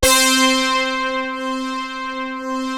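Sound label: MOOG BASS C2; USB Soundscan vol.49; Analog Fantasies; AKAI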